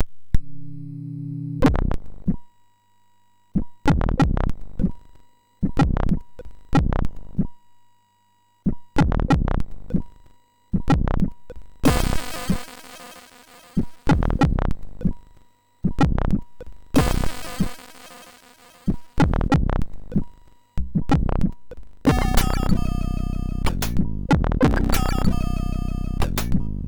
MOS6581R4; sid

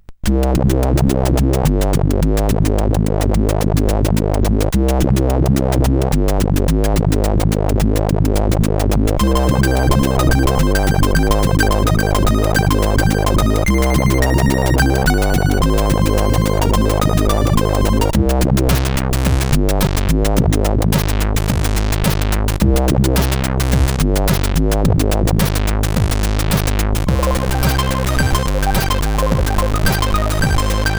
CSG8580R5; sid

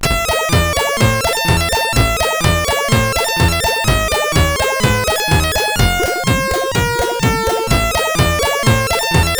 sid CSG8580R5; Fiendish Freddys Big Top O Fun

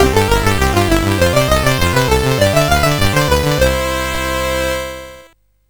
sid CSG8580R5; Bomb Jack II

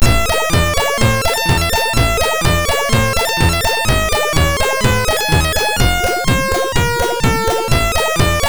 sid MOS6581R2; Fiendish Freddys Big Top O Fun

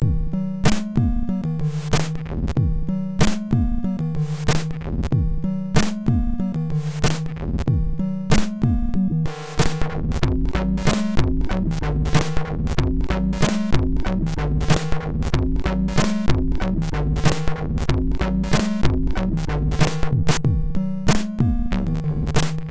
MOS6581R4; sid

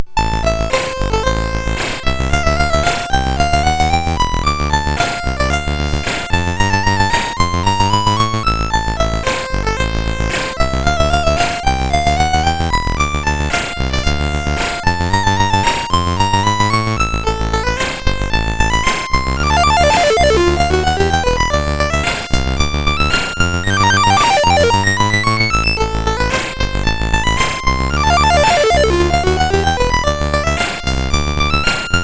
sid MOS6581R4; Bomb Jack II

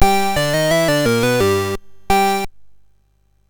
sid MOS 6581R3; GeeBee Air Rally

